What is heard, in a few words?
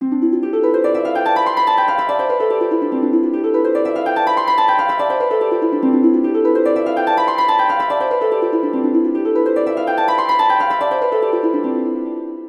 musical harp roll dreamy